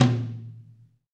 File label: TOM TOM